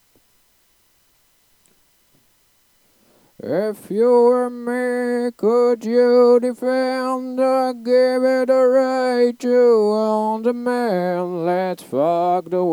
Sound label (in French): refrain chant